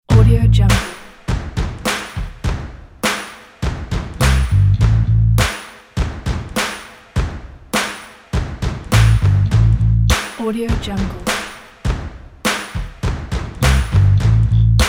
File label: uptown swagger